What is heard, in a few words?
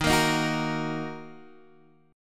Eb Minor, Guitar, Half Step Down